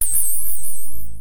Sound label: happy power